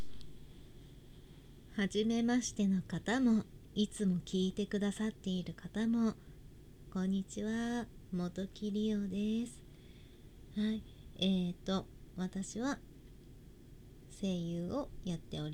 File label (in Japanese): 声我慢しながらクリ吸引オナニーするセクシーお姉さん; 【実演】声でてないよね…？必死に